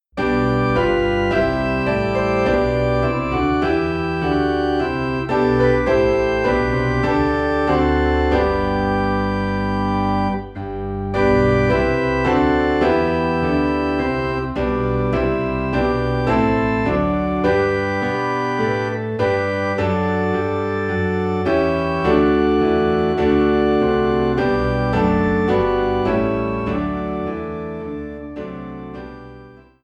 Piano and Organ in G

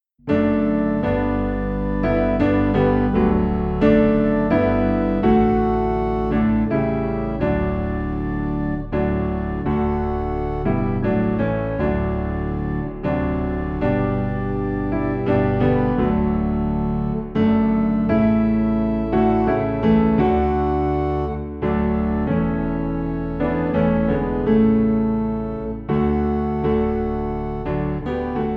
Piano and Organ Low in D; I Need Thee Every Hour

